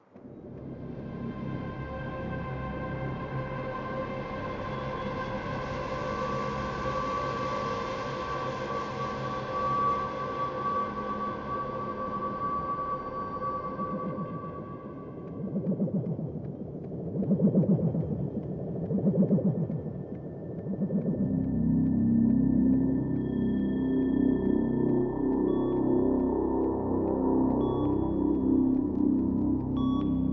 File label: space ambience dangerzone; Angry Birds Space